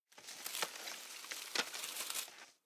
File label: Bushes; we are the dwarves gamerip 2016